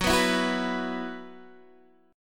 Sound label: E Suspended 2nd F sharp